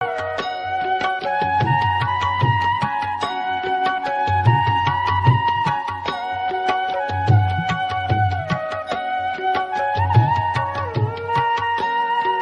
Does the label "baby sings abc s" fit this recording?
No